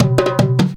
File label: junku groove; Best Service; World Colours